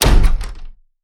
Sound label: perc door hit